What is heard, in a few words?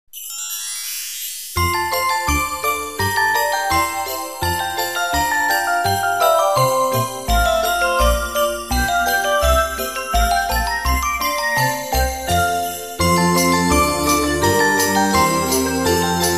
Christmas Jingles; Koka Media Productions; More Christmas Jingles